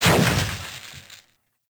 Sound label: Drone Explosion; Warframe; Caliber Chicks Minigame